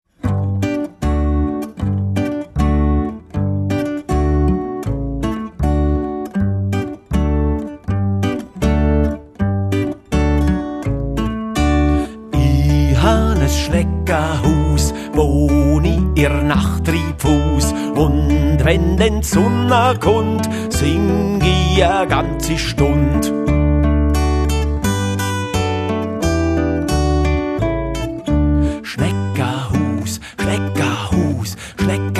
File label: music